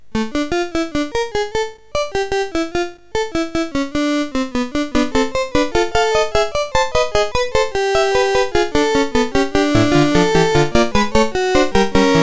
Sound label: Fugue, MOS6581R2